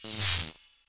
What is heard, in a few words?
spark; outfly